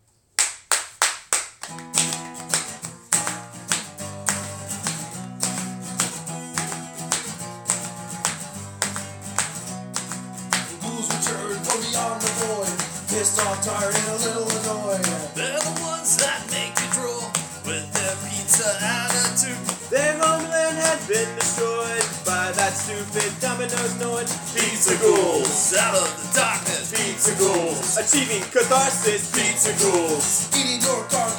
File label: acoustic